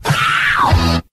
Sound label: "wow" sung